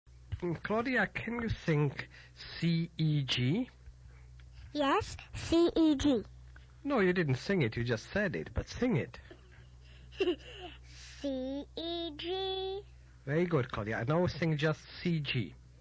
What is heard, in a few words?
Sing Intervals